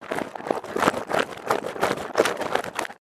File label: Jigsaw puzzle box moving and shaking